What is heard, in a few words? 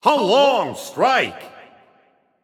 Long Hit; H0wRd C0Sine Driftball